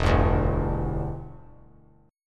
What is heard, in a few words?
E Minor 7th, down; strummed